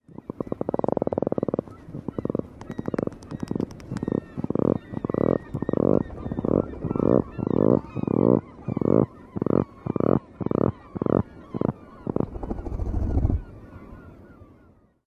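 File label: sputtering snores, puffin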